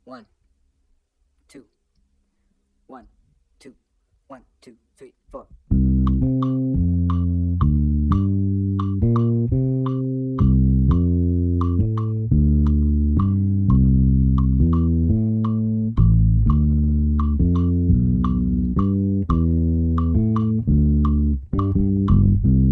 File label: bass mambo and son montuno 8 tumbao on blues